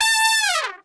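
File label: A 3 TRP SHF, Trumpet; Best Service; Brass Super Section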